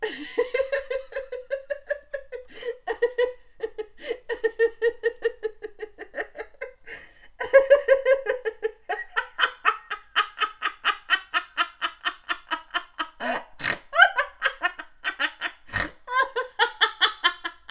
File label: Unhinged Laughter